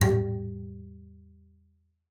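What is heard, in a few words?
bass col legno G3; Xsample VOL-5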